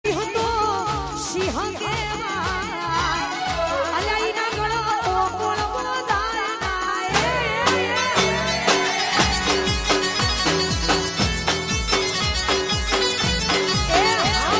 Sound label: Birthday Gujrati song